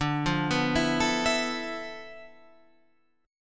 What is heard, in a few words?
D Minor 6th Add 9th D, Guitar, Open F; Dm6add9 arpeggiated; JGuitar